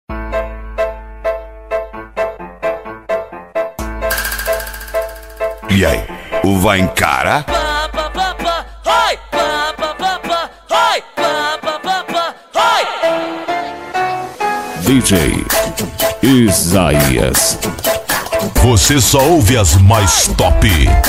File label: funk bass automotivo